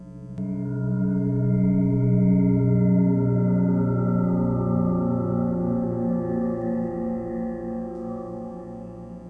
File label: bell